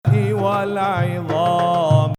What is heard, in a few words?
2nd Ajam